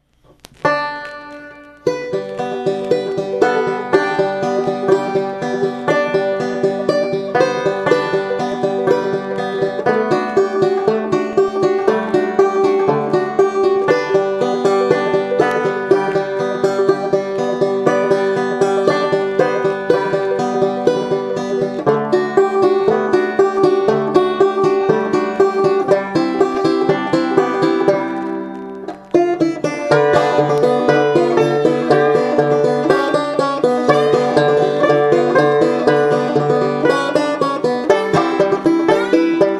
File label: Banjos